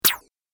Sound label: Arcade Game Laser Pistol 1 Shot; Video Game Sound Effects; Retro Inspired Sound Effects - Think Nintendo, Atari, C64; Andrew Fleming